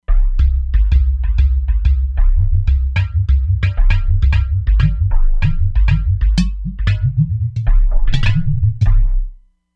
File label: Udu Grande, E-mu Proteus-3; Factory patches